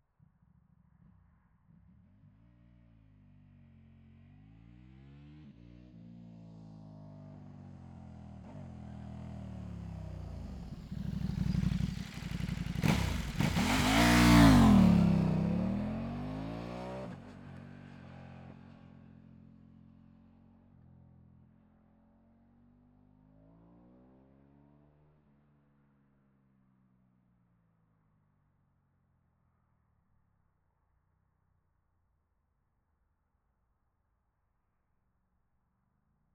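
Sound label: KTM RC8R exterior fast approach stop away; MKH8040; ORTF; Pole Position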